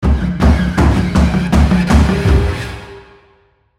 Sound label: Big Drums and Pipes End Tag; The Last of Us